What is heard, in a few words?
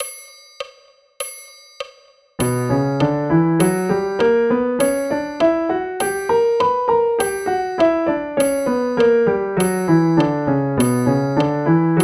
B minor scale and arpeggio; bastien